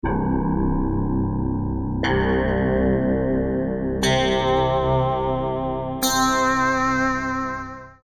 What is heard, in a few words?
stratotron